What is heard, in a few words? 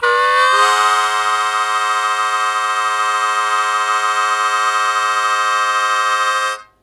Blues Chord Attack Ab1, Harmonica; Zero G - Harmonica